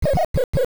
Cucco; Cucco clucking

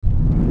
Elevator Start; Fire Spirit Temple; Zelda; OOT

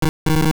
Error; Error sound

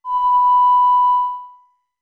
Notes Ocarina B long; OOT

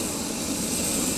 Fire Wall Loop; Zelda; OOT

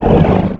Hit; ReDead; zelda sounds

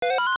Turtle Rock Blink; Turtle Rock blinking

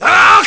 Gauntlet Attack; Byrne; zelda

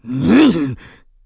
Sumo Escape; Sumo match: Bo escaping Link's grasp

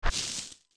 steps tall grass; Walking in Tall Grass; Young Link uses 1 and 2 Adult Link uses 3 and 4; OOT

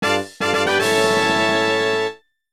Fanfare Small Item; Get a small item

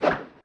Sword Slash; Basic sword-slash

